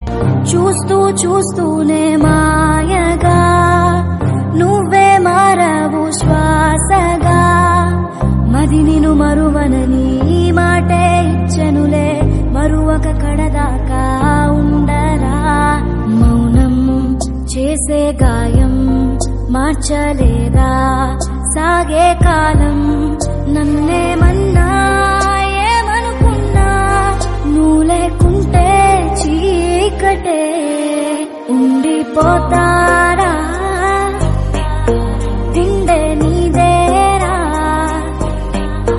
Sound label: Telugu Song Female Version; Hushaaru